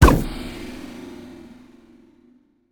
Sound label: Defeat Pop; smite; god cupid skin13